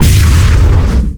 explosion charge; weapons; cow mangler; tf2

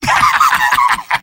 sniper laugh happy; vo; tf2; mvm

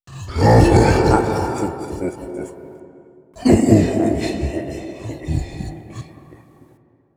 chuckle; nihilanth

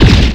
plasma; skibidi toilets toilet team requeriments; 40watt

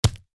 heavy taunt chest hit; tf2

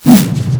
arrow near miss; weapons

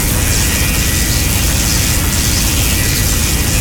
phlog loop crit; tf2

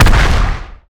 shot impact; Garry's Mod; omnidroid 12

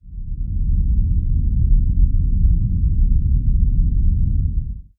halloween boss summon rumble

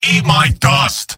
m battlecry; tf2; garrysmod; mvm

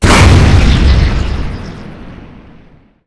demon shoot; Garry's Mod; popp5 lily love braid

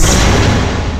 glitch dash sound; skibidi toilet glitch

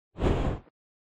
huggy jump; critters; nightmare criters

